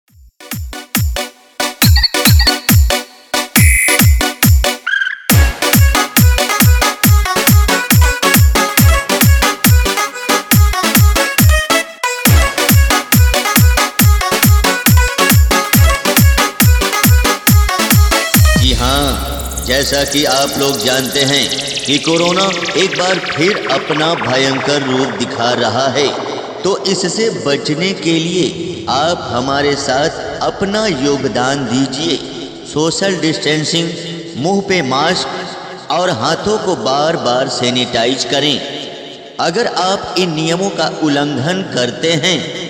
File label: Vibration Beet; Corona Viras Spl Warning Testing